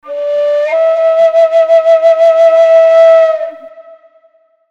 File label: whistle a